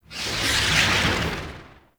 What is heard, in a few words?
Bullet Jump Whoosh, Vehicles Motorcycle; Warframe; Motorcycle Ninety Nine